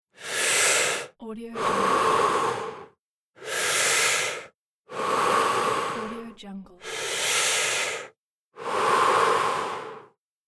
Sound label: slowly breathing through mouth